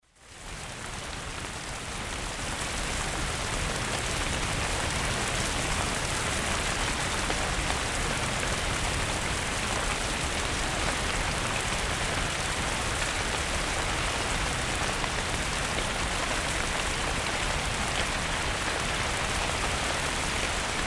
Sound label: Rainstorm